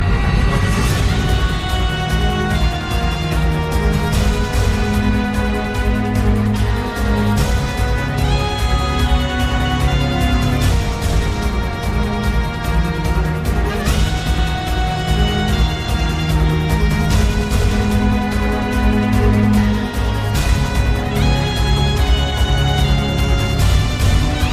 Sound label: alarm ringtone; cobra